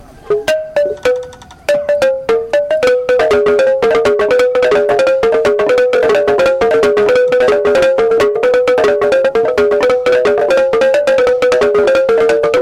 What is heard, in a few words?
both men playing a balaphon, Uganda; Kilembe Uganda